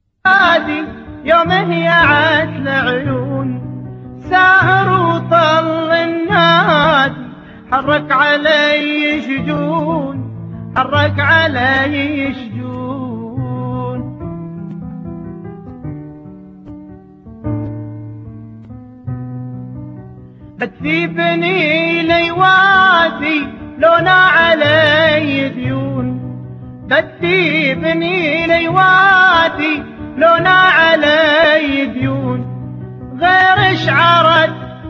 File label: piano; emarat